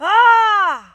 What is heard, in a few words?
anger